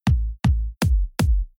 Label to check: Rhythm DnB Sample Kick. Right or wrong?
Right